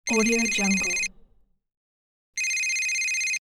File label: cellphone rings twice